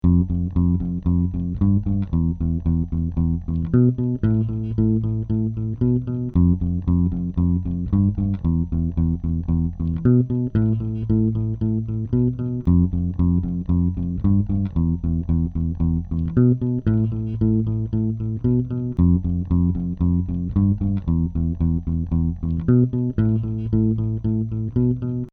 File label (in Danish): Simpelt guitarspil bas